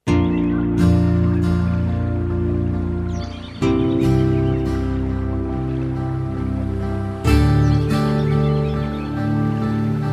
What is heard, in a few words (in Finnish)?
kitara